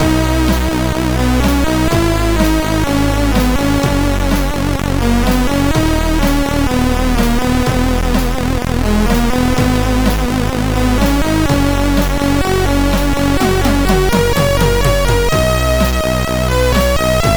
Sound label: loader, CSG 8580R5; Choppers